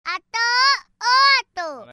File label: upin ipin ringtone